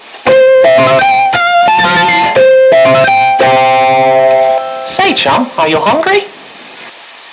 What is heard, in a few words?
vend; ambience